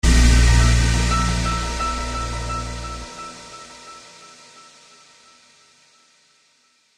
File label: Crash